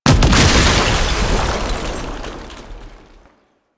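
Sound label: Sniper Alt Fire Projectile Explosion; DE; Warframe; Frumentarius; Exalted Sniper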